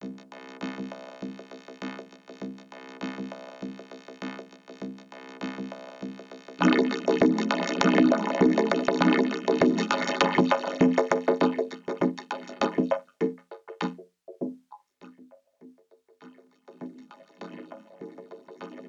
vocoder drums water

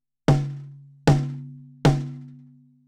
Warm Audio TB12 Snare K3U ME40 x18 vintage nickel

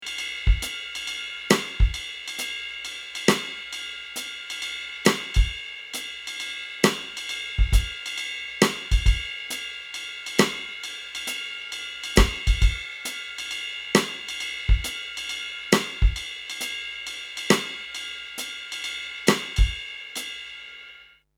Jazz

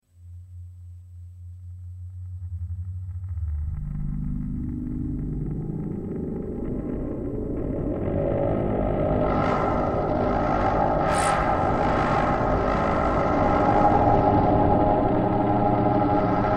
howling Sines; Expressive E Osmose

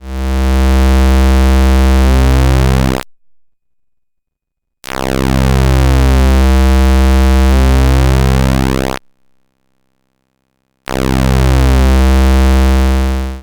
uniCycle Square

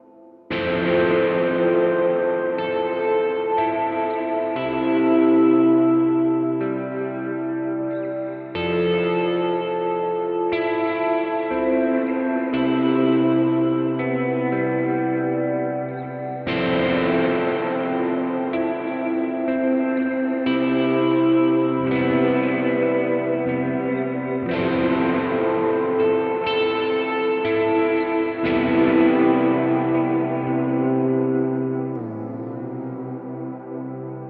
death by audio rooms room

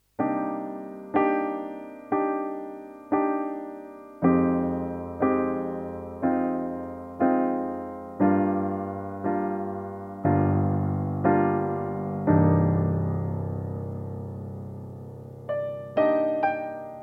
Alesis Prestige Artist Upright Piano

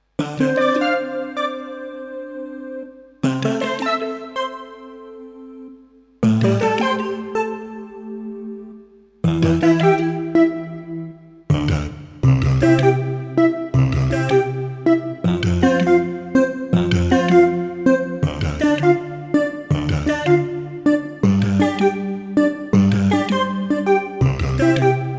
Kurzweil Forte SE Multi

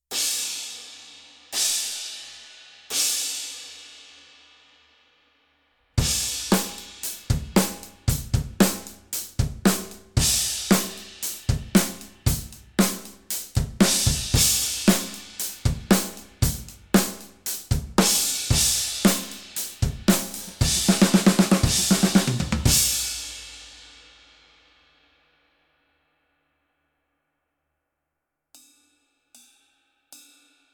Dual Crash

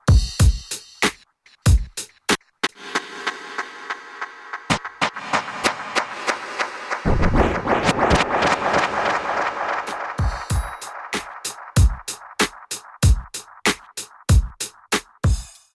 Break Verb

